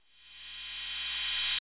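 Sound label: 808 CRASH REVERSE, HOUSE KIT; Drums In Your FACE!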